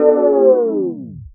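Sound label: synth, Production Effects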